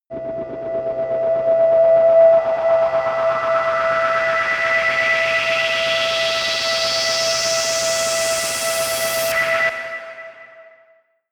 rise hum whir high

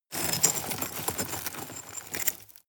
scrape rocks medium

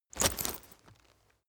leather metal impact chink

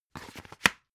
dvd case remove booklet

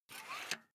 air pump small out

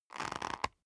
leather creak short soft

